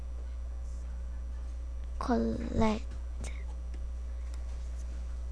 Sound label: correct